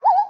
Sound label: parrot idle; minecraft